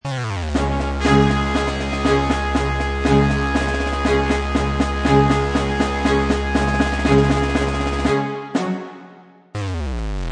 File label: airsoft victory